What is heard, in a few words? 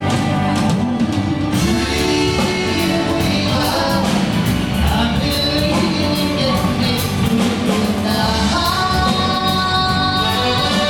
Half Time Shuffle